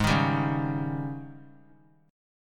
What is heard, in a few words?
G sharp Major 7th Suspended 4th G sharp; G#M7sus4 strummed; JGuitar